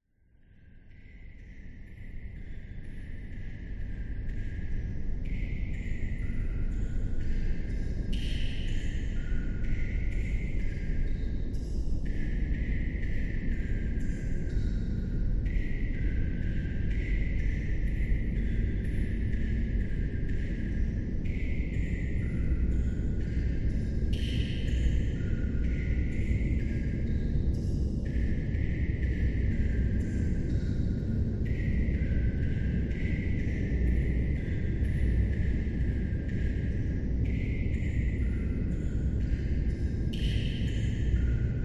horror dungeon amb howling wind outside water drips